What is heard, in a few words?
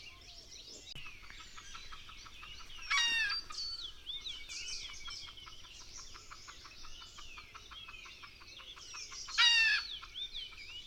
Red junglefowl; مرغ جنگلی سرخ